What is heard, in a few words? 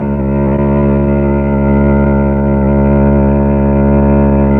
STR, Melo. Cello, Slow Cello